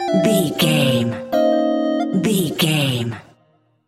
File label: jingle chime negative